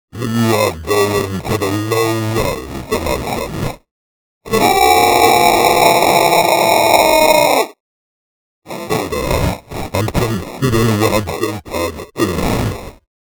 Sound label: low res dimension sfx